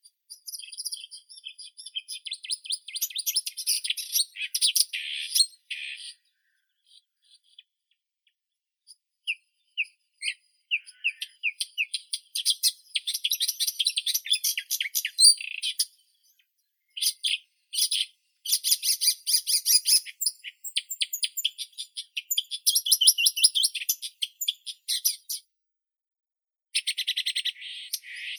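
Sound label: marsh warbler, Acrocephalus palustris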